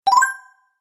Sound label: Health Boost, video game